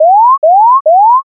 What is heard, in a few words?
scan